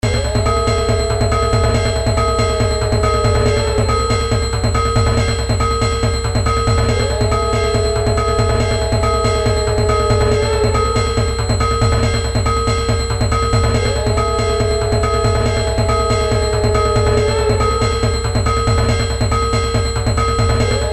realdhaak

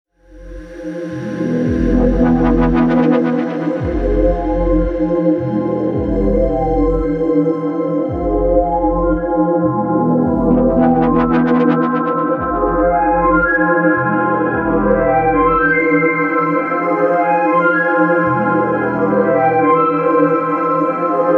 electronica

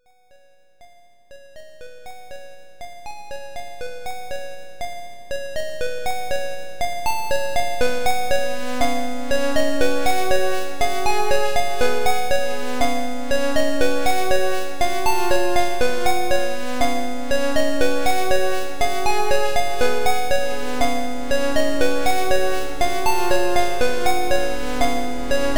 chiptune; smrom

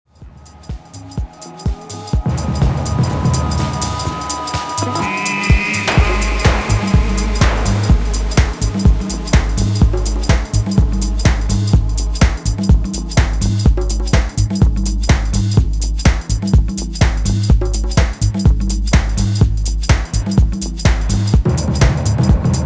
prison blues

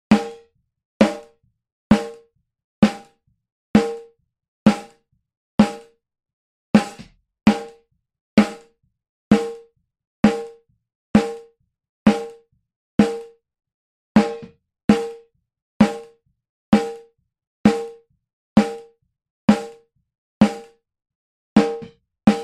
gated snare

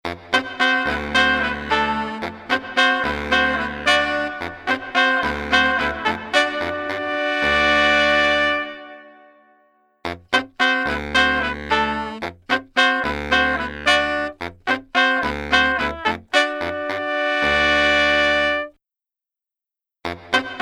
horns, horn room

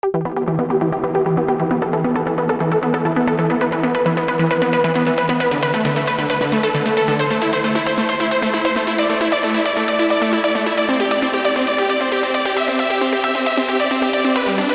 trance

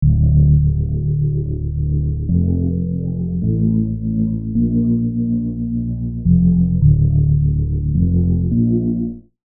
serum basses; chiqui audio

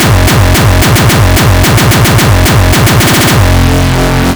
terrorcore beat 220 bpm

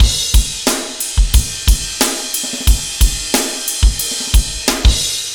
2 bar chorus 90 bpm

